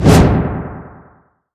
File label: remnant create; weapons; dota; ember spirit